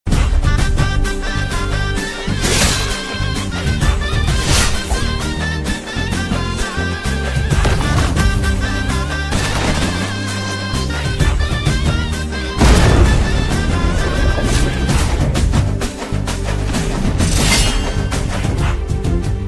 bgm Telugu bgm ringtones songs; ayudha pooja; devara movie; jrntr

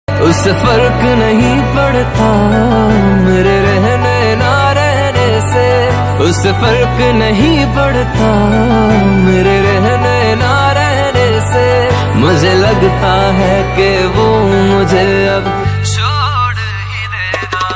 ringtone instrumental flute ringtone

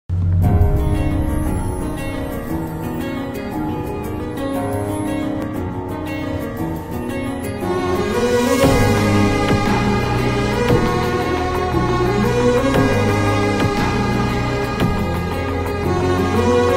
heart touching bgm ringtone, love bgm; premam; sai tej

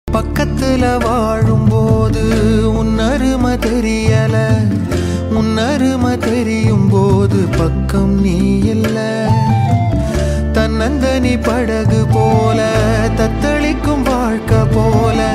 movie song tamil songs; ayothi movie

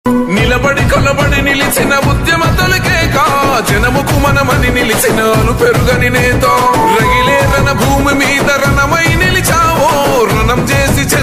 political song; pawankalyan; janasena

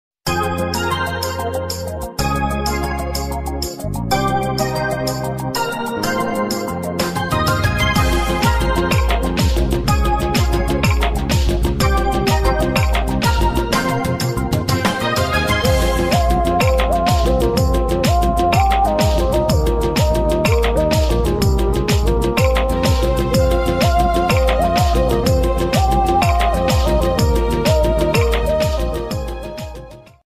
jesus ringtone sadri song ringtone; yeshu masih

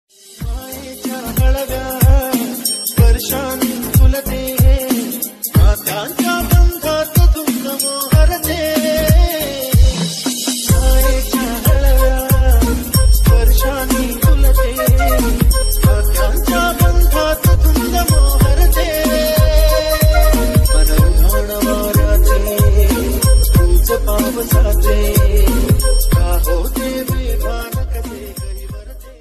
song ringtone marathi ringtone

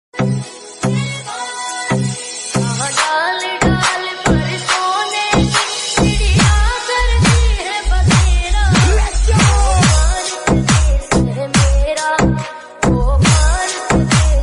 desh bhakti music ringtone

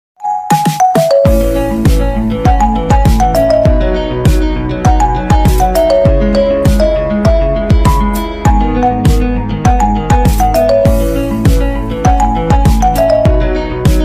ringtone marimba

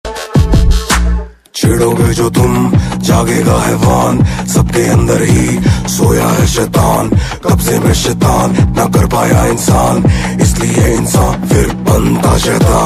song ringtone movies bgm ringtone; Ajay; Shaitan movie

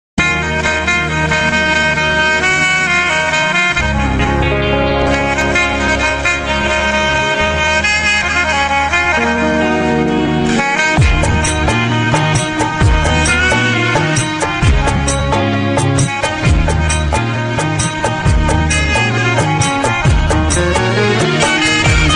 ringtone song instrumental

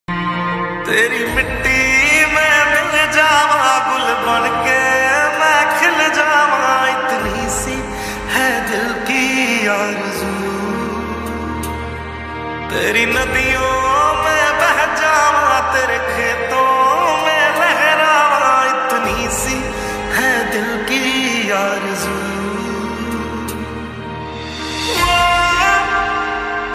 lofi version; independence day special; ghost war bgm

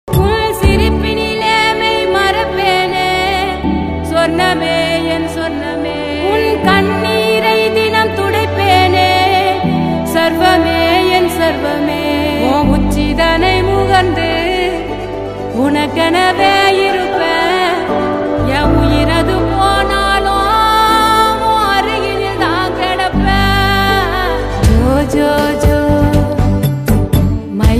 song whatsapp status tamil; aranmanai 4 movie

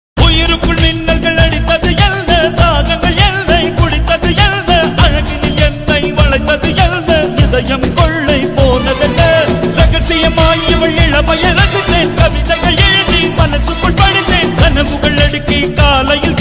tamil love song

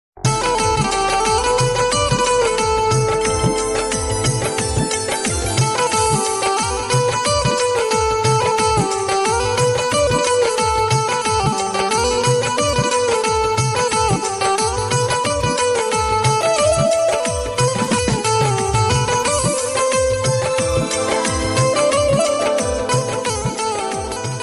ringtone old ringtone hindi background music; maharaja 1998

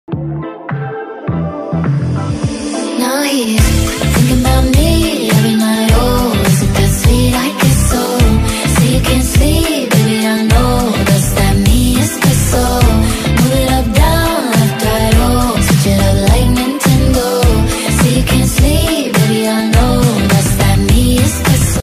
ringtone trending english song

